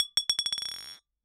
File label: Bottle Struck